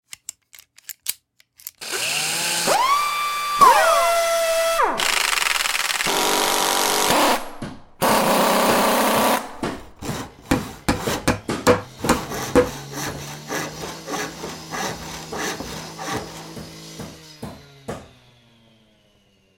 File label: Tools; Big Room Sound